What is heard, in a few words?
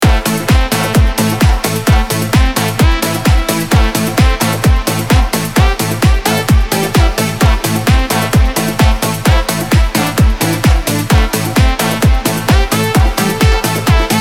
Cardio Swing 130BPM